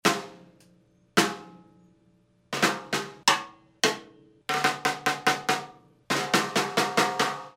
steel snare 14x5

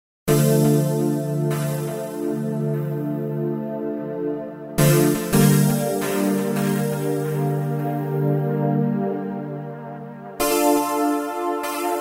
synth bells

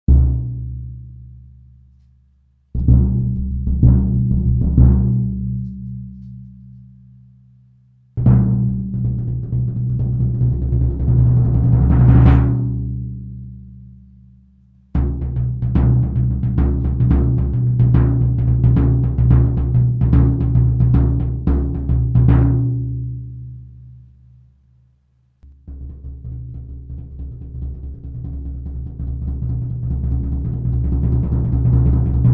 tko custom bass drum timpani 22x14

